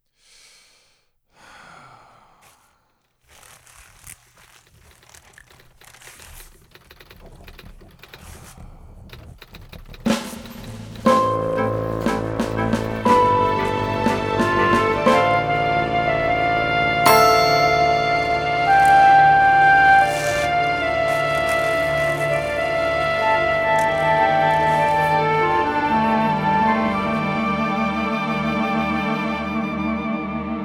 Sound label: ominous underscore